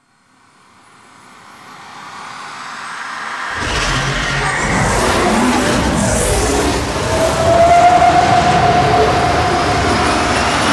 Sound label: Extraction Space, Vehicles; Warframe; Liset; Liset Flight; Zariman Liset